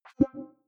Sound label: click big bubble high